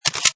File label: Slides, Button sound; Multimedia